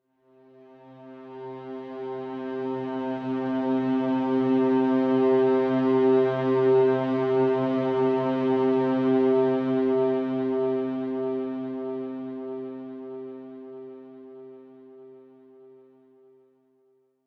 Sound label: ambient, vulcanus